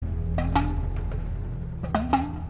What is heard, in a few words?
drums